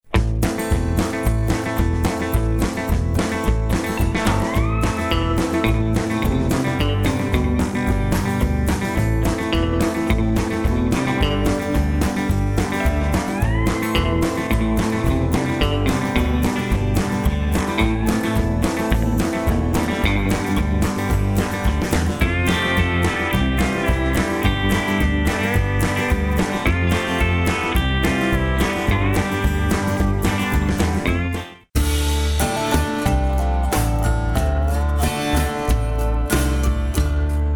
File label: country